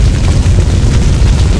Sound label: Earth Fire, Damage; DCS